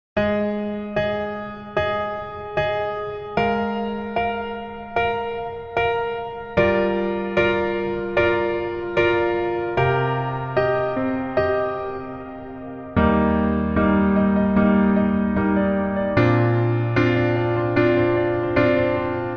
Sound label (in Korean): Piano 멜로디MR -1키